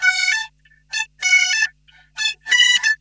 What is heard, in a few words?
OUTABREATH, Funk Guitar; 90 s Sample CDs; Zero G